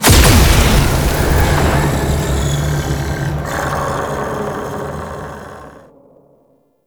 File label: Portal Shatter Open; Ambience; Props; Warframe; Duviri; Murmur